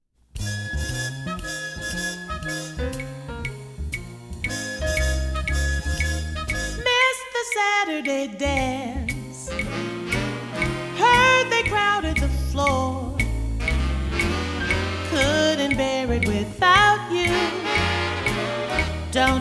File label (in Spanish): pulso